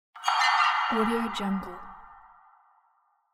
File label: suspense accent